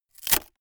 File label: wood splinter